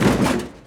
Small Impact; Totaled; XBOX

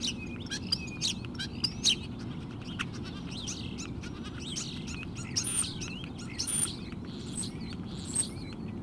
Nile bird; Splashdown; XBOX; Soultree

